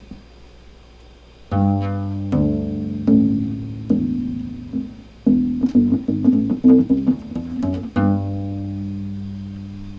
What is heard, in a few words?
bass